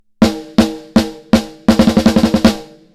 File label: snare